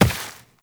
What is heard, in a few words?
Step Rock, Footsteps, Walk; Warframe; Tenno